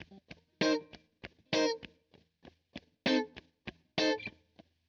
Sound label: Electric Guitar, Loops; Guitars